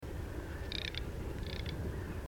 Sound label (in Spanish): cerceta carretona; Anas querquedula